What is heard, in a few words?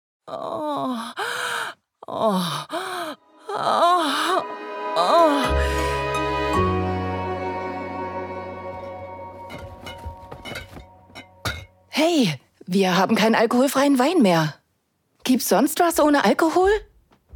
female moaning; Mutual Desires; Sündiger Mädelsabend Unser wilder Dreier ohne Tabus (Sinnliche Geschichten); Sinnliche Geschichten Podcast